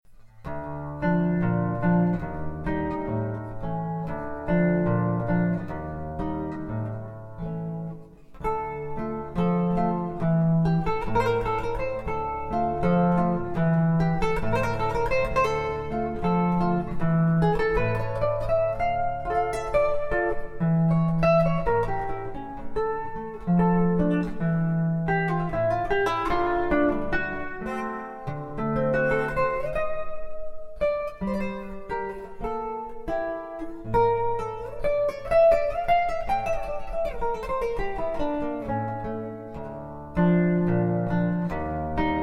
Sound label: caprichio arabe, classical guitar